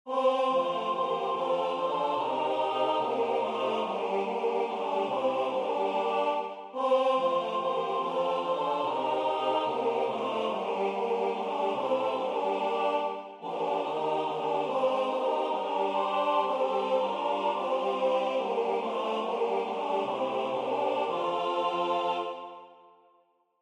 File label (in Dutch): zoals de mensen leven satb; vermulst